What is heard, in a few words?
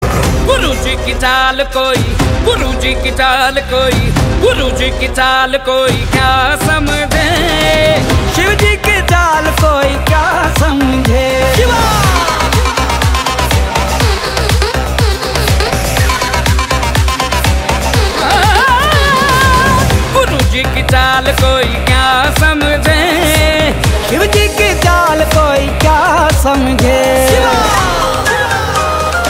Ringtone; hindi